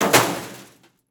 Crash Crane Drop Car Short; crashes; AdobeCC

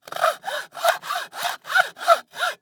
Industry Tool Saw Sawing Wood

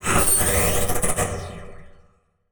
Transition Laser Distorted; Production Element

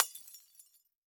Crash Light Bulb Smashing On Concrete; Crashes

